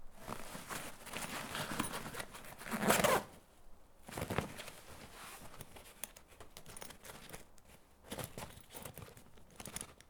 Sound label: looting, sportbag; Escape from Tarkov